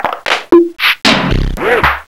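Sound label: percussion efx; Rubber Chicken Software Sample Bank; OLD SYNTHS; OTHER OLD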